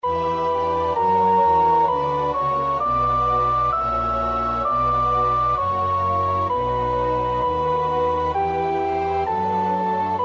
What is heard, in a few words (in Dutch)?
sopraan, koorpartij oefening; Bach (Johann Sebastiaan); BWV 004; 8.Wir essen und leben wohl